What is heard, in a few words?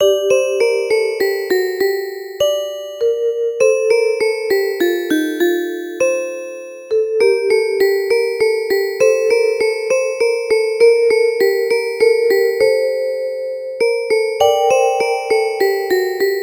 Lullaby